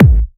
Trance Kicks

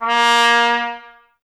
BRS TP A#0AR, Trumpet; Miroslav Vitous

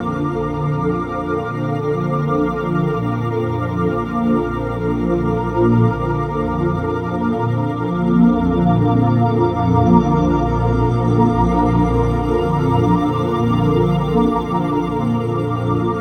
Guitar Textures

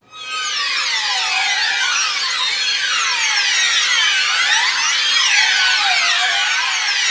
STR FX, HORROR; East West - Global Sounds

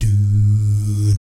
DOO, Vocal Planet Jazz

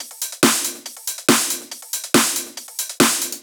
Loops 140 BPM Hihat Percussion Loops

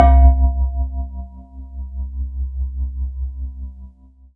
C2, Synth Line; 90 s Sample CDs; NiceBeats; SynthLine VOL-32

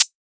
Closed Hi Hat Dembow; RESISTINSIST

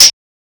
Hats, Alesis Performance Pad

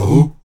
VOX AHOO, Afro Chants, Stacc Ah Oos

